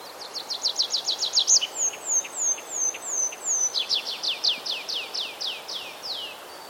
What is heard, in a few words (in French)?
pipit des arbres